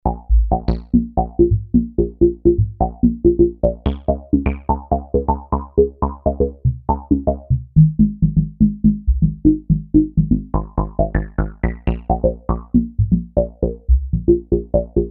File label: synth and hold, akai s2000